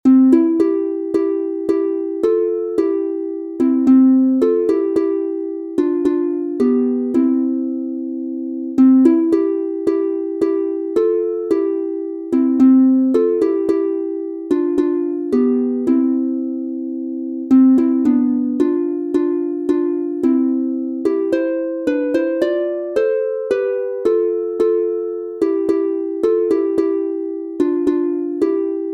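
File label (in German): C Dur instrumental, Harfe; Wanderlieder; Nun ade du mein lieb Heimatland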